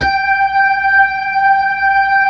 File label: B-3, Blues, Keys of the 60s and 70s; Roland LCDP10 Keys of the 60s and 70s 2